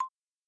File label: Instrument